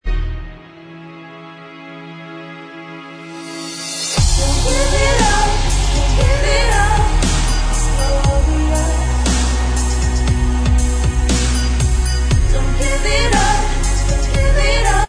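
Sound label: backing vocals, backing tracks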